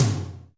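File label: GATE tom; Crypton - DrumBase5000; GATE KITS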